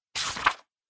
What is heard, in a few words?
Book Flip